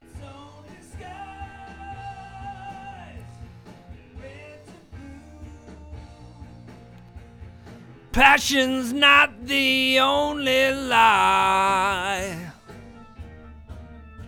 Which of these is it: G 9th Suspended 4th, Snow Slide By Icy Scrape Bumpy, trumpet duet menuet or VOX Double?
VOX Double